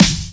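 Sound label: snare vinyl, drum samples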